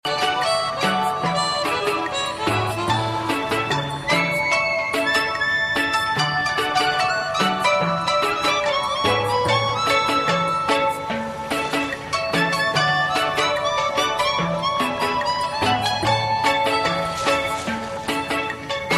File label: drum band background whistle tone